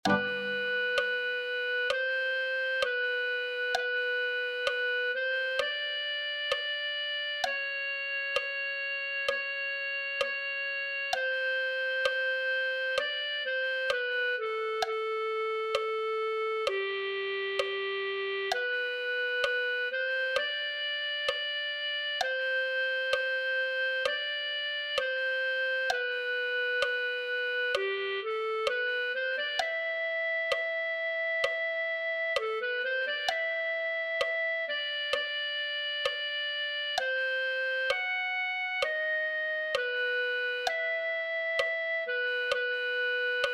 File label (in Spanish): clarinete